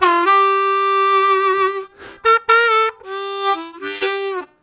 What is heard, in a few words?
Sliding, Harmonica; Zero G